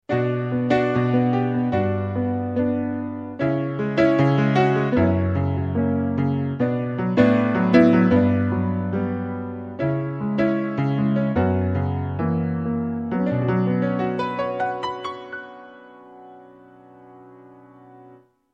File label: Piano